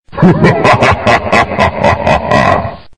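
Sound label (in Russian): ха ха ха смех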